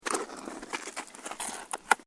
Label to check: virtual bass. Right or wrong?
Wrong